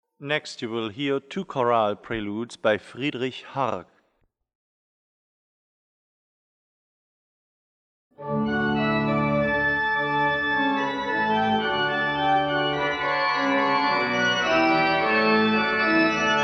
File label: Chorale Prelude; Two Chorale Preludes